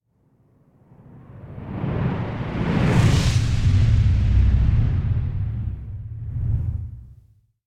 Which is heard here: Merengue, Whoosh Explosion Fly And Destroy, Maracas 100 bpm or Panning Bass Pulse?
Whoosh Explosion Fly And Destroy